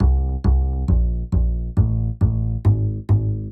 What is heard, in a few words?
Walking Upright Bass